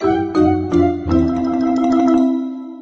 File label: fail